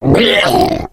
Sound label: female zombie growl; voice; Left 4 Dead 2; boomer